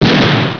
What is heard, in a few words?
gun